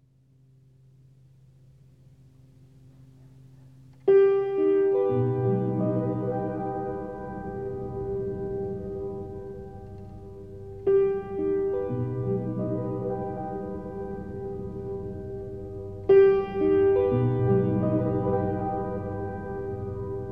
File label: piano